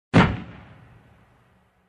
Tank shooting

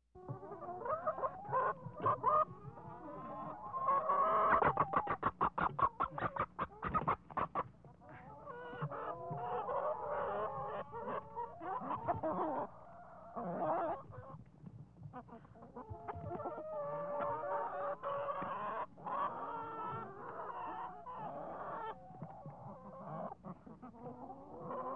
Chickens clucking

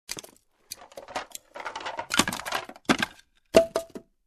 Dropping Small Wood Pieces

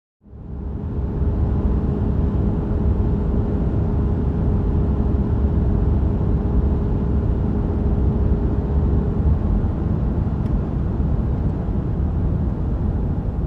Parking car from inside

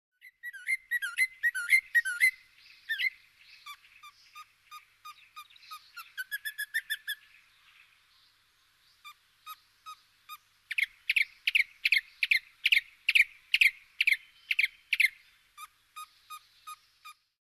Hatchling chirping and singing

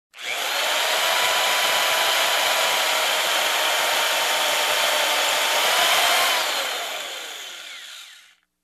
Drilling into a concrete

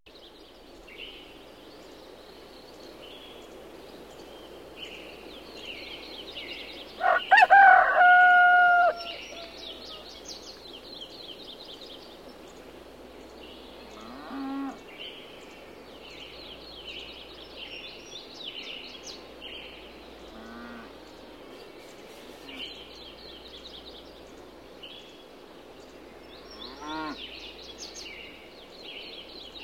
Birds Chirping and Rooster Crowing in the Morning

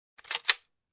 Firearm trigger sound

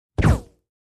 Weapon dull bullet sound

